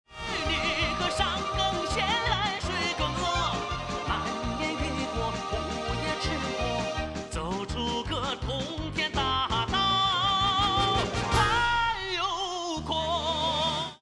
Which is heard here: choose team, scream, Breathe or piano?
choose team